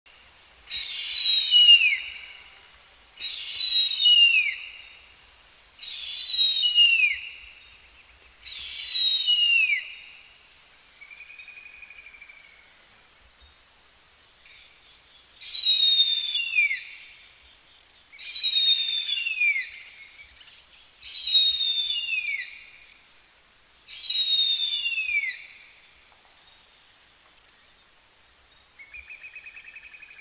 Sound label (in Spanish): Harpa o Arpa Mayor Cantando Sonido para Llamar